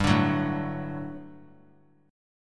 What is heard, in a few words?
G Major 7th Suspended 2nd G down; GM7sus2 strummed